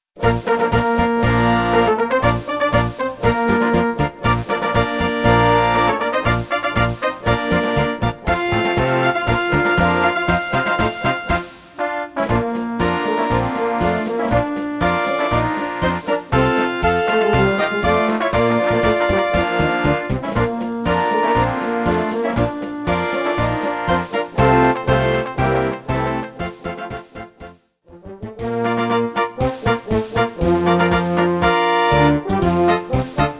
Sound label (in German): Marsch